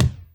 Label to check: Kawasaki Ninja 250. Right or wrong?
Wrong